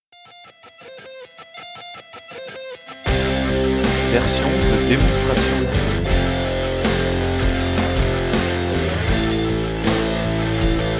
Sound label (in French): playalong avec batterie